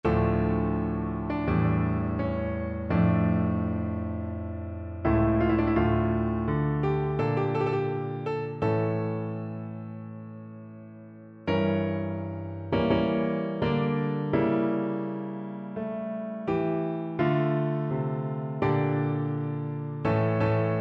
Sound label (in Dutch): miniatuur, piano